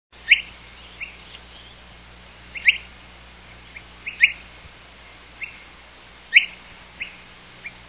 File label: custulatus, turdidae; drozdek okularowy - nawoływanie; wroblowe; turdus